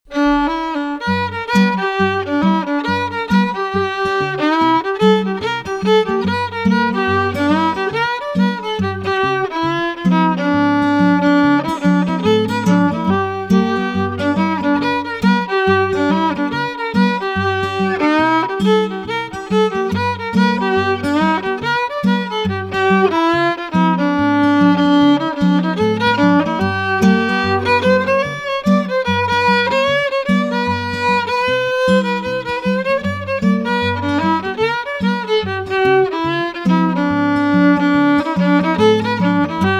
House of David Blues, field recordings, Mississippi music